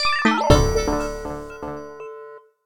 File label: ingame jingle game over; level 22